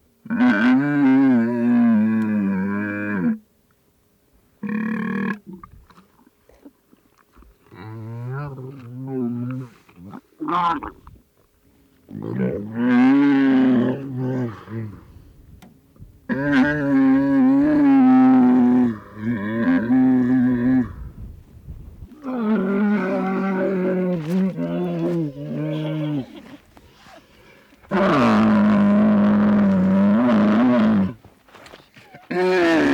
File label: Camel